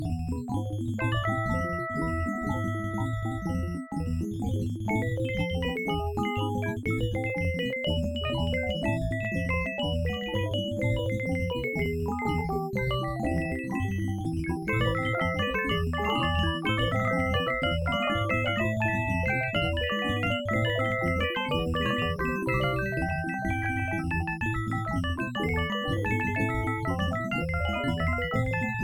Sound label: Chip Tune